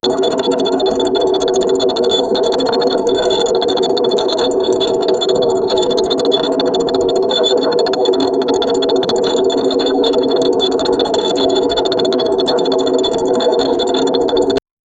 jeneratör, activation; jenerator balatma game

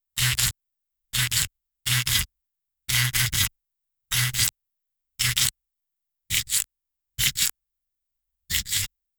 doal gereki bir ustura il, grooming

